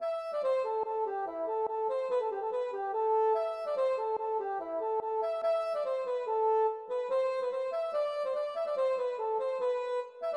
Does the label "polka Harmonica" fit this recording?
Yes